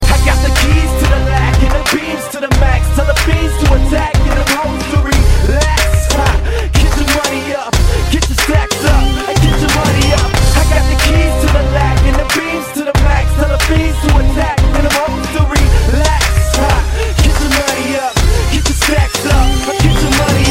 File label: chorus, hip hop ringtone